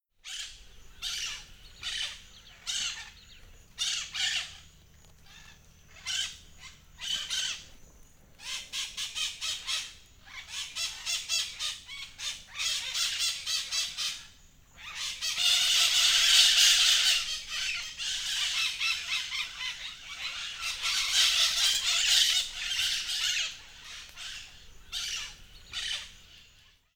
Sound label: Parrots squawking